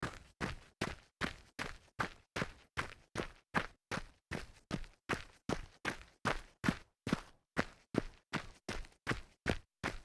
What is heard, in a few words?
jog on rocks